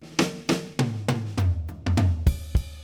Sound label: Fills 102; Zero-G; Monster Beats